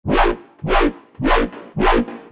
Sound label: self destruction alert